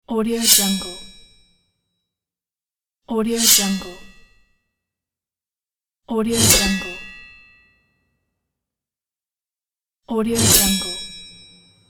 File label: sword hit and blood